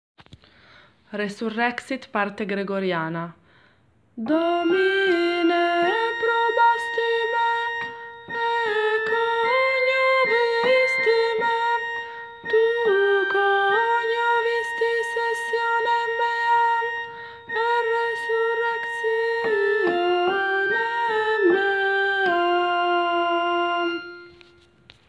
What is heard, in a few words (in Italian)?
Resurrexit parte gregoriana